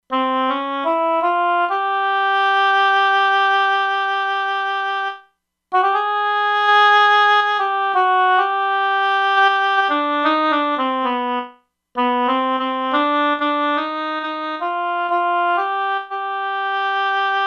Oboe, E-mu Proteus-2; Factory Presets

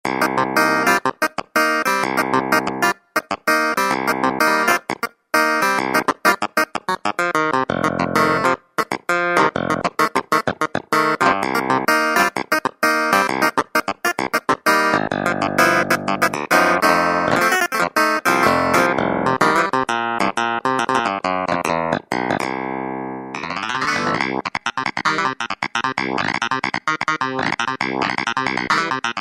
Super Clav, Kurzweil K2000; Kurzweil K2000 Contemporary ROM

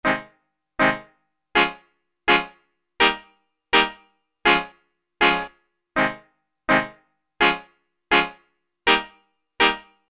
Reggae Chord, Roland SR-JV80-06 Dance

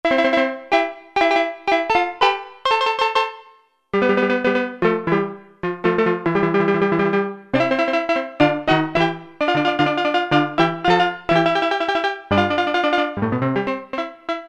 Percussion, Cheetah MS6

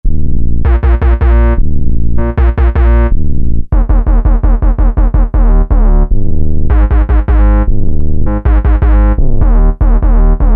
Trance Bass; Factory Preset examples

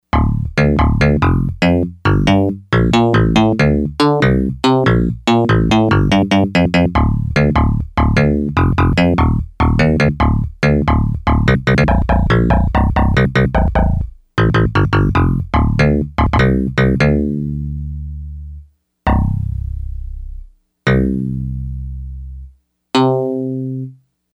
Elec Bass, Yamaha DX100; Factory Patches

